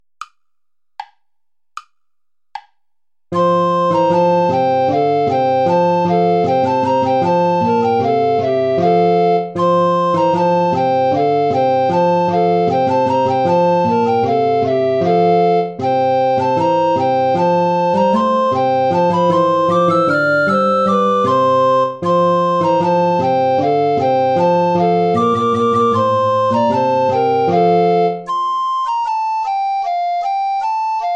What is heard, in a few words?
play along without alto recorder; traditional; deck the halls